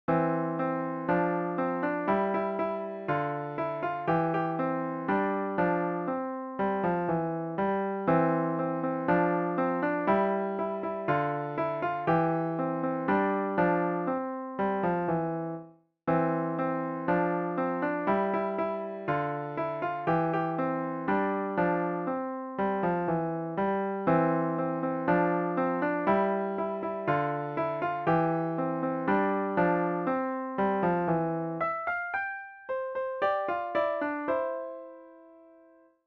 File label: piano solo